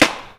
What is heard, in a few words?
spyder no clip dry, guns; spyder se no clip edited dry vol change